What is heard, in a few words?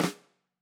Flam, drum